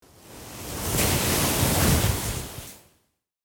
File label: Sleeping bag dragging noise